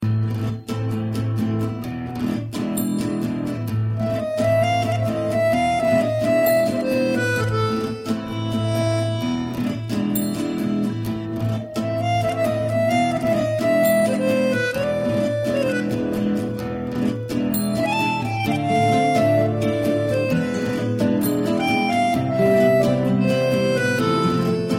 Mexican Music

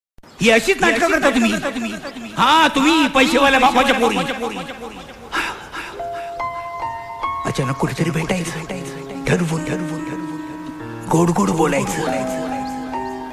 Marathi Heart

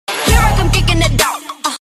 Kick in the door

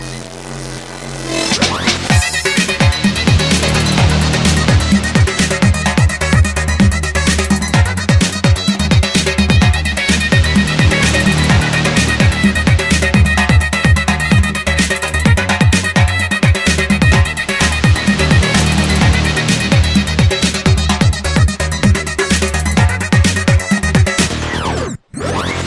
armenian; Qochari

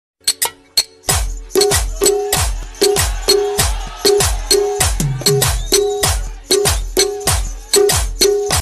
Saudi beats

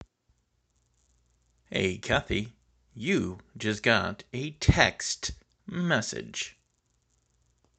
Text Alert

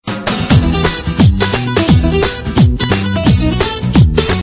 Hip-hop Gitar Tune

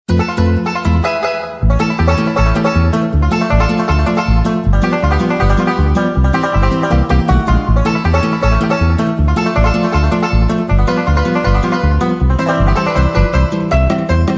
banjo athletic; Mario

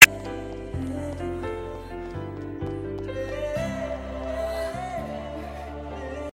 Sad Ton

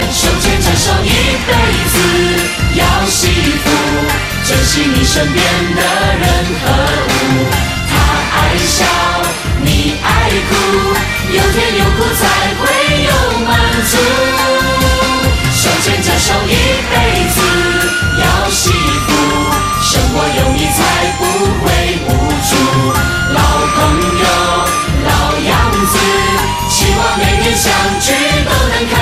chorus

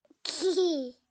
Snickering

